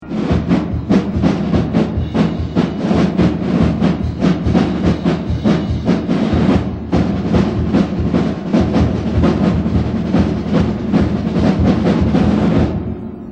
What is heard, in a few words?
Percussion